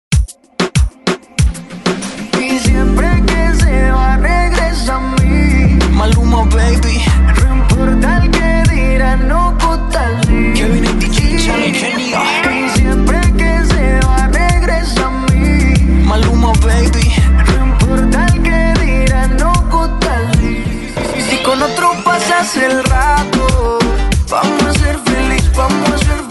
Extended 95 Bpm

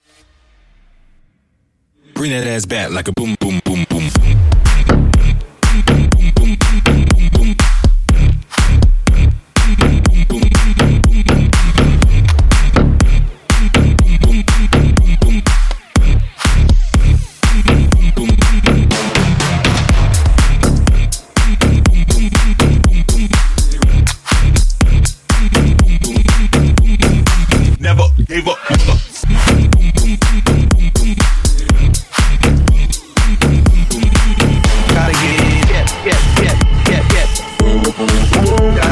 122 130Bpm Dirty; WordPlay Segue

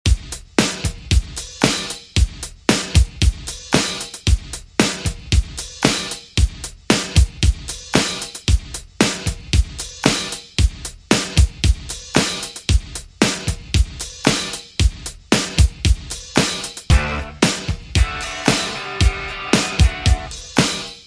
80s Dj Intro Outro 114Bpm

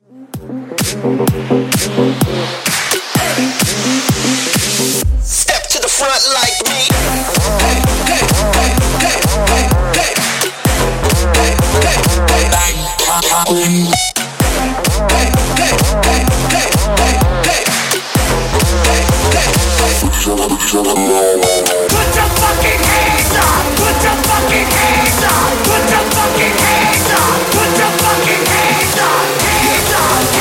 128BPM; Banger Edit

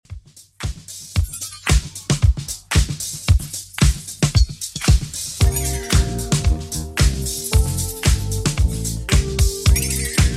80s Redrum 113 bpm CLEAN

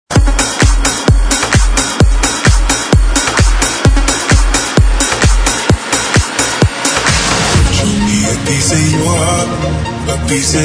Bootleg

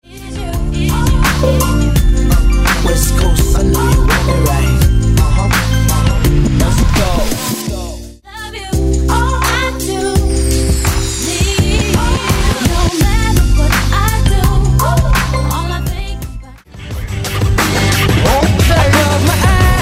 Throwback Hip Hop N RNB Megamix

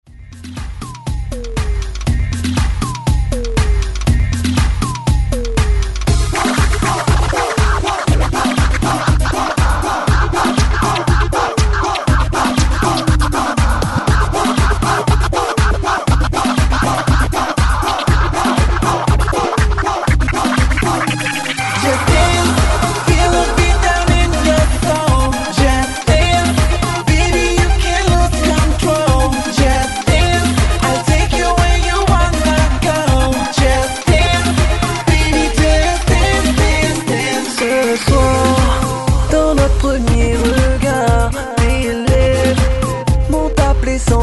Hype Scratch 120Bpm; DJDOLLS

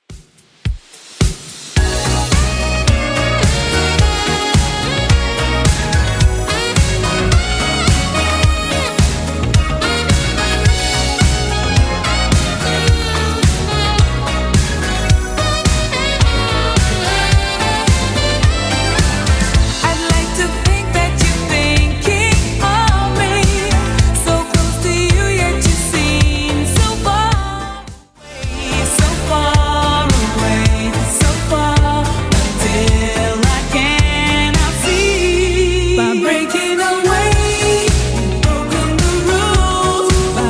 80s Redrum 108bpm

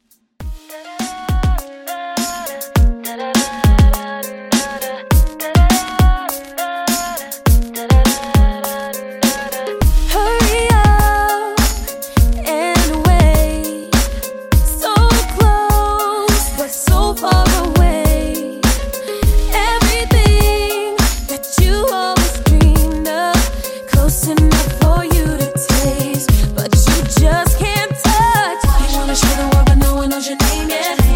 Pop Redrum, 112

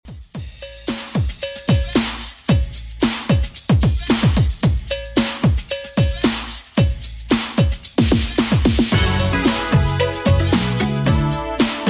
90s Redrum 112bpm CLEAN

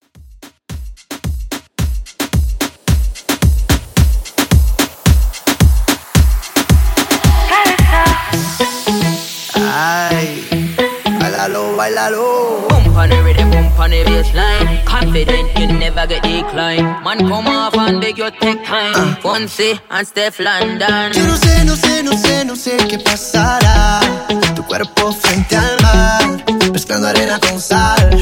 Moombah 110Bpm

